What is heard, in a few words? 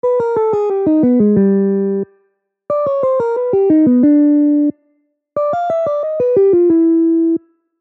major 251